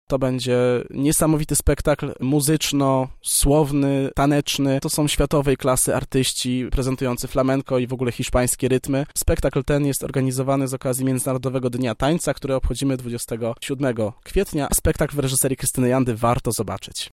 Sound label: Flamenco